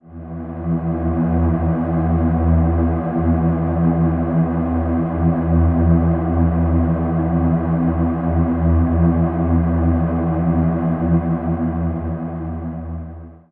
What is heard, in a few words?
Space Monks E, Ambient Pads; Zero-G Seismic Frequencies; 90 s Sample CDs